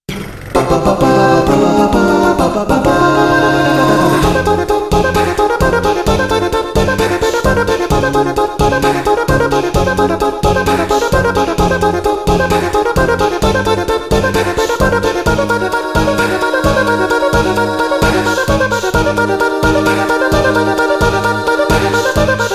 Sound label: Victory Fanfare; FF7